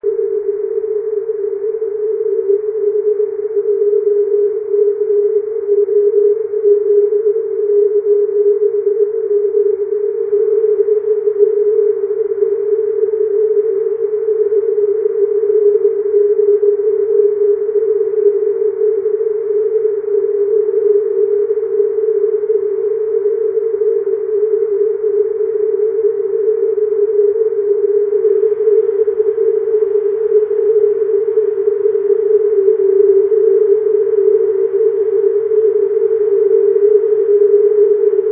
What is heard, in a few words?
6m eme with fft filter; jh2coz